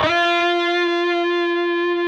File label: PRS F#, Guitars, LEAD